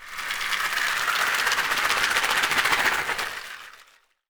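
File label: AFRICAN PO, SHAKER; Voice Crystal - Steve Reid Definitive Percussion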